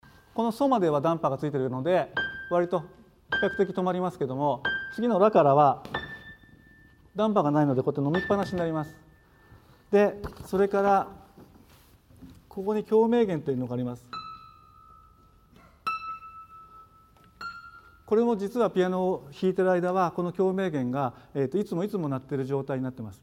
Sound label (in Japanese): 共鳴弦